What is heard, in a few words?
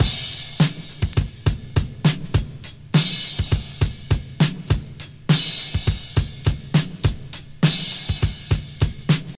103 bpm drum loop A key, kick tuned to the A note